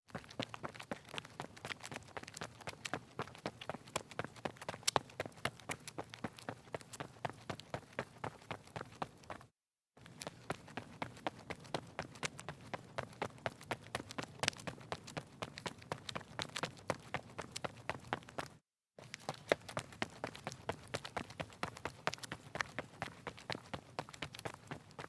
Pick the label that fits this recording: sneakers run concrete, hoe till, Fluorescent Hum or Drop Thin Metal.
sneakers run concrete